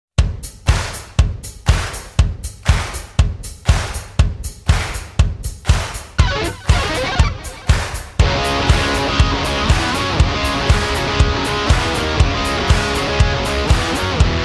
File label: Crowd Chant